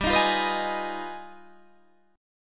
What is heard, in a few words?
G 7th Flat 9th Sharp 5th